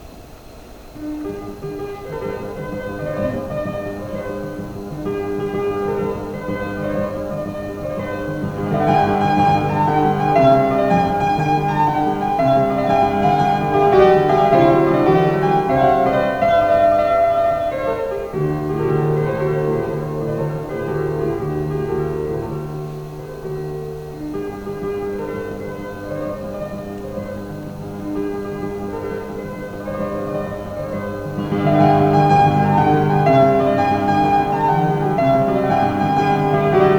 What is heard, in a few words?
Vivace for piano